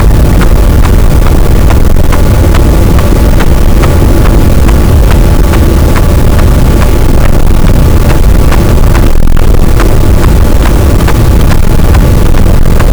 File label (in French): cachalot, Physeter Macrocephalus, gaussian noise